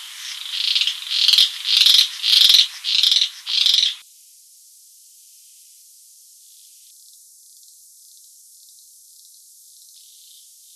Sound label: R corsicana